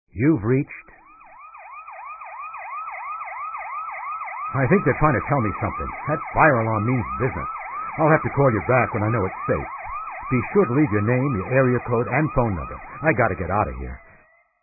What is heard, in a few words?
Fire Alarm